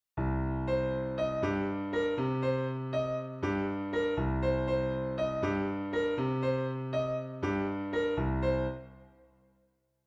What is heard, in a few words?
montuno a tumbao